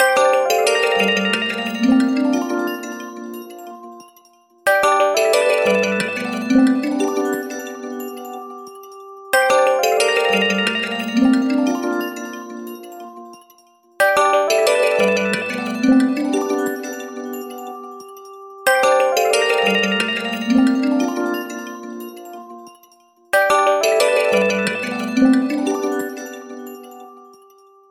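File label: htc standard sms sound, another melody from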